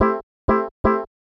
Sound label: house synth 125 A, keys